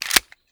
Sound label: mag in, weapons; usp